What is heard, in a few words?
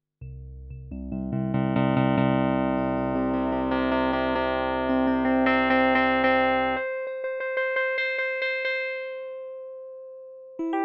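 K150 vibraphone electric piano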